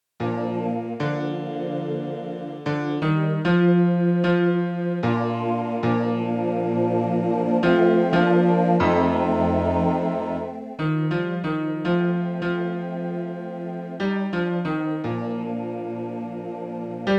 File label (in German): 2. Bass; jodlermessen